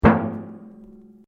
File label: RUBBER ON METAL HOLLOW; Materials